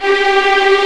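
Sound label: G4 tremolo, String; East West; The Ultimate String Collection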